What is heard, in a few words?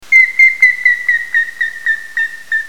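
grey-headed woodpecker; yamagera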